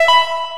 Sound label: ui confirm; anvil